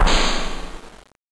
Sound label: rack stop